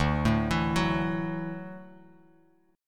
D-Major Add 9th D down Bass Standard; arpeggiated; JGuitar

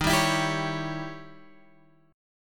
Eb 7th Flat 9th, Guitar Open G

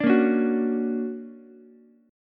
D Augmented Major 7th, down, Ukulele; D+M7 strummed; JGuitar

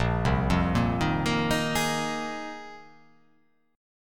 A sharp 7th Suspended 2nd Suspended 4th

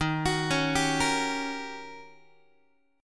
Eb 7th Sharp 9th Eb, Guitar; arpeggiated; x,11,9,11,11,9; 4A,4E,5A,5D,5Gsharp,6Csharp

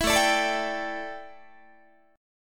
A 7th Suspended 4th, A, down, Guitar

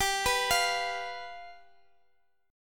G 7th G Guitar Open F; arpeggiated; x,x,x,14,14,12

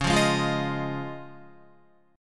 C sharp Major Add 9th C sharp down Guitar; C#add9 strummed; JGuitar

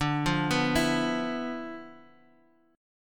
D Minor 6th Add 9th D Guitar Drop D

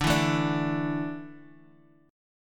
D Suspended 2nd D down

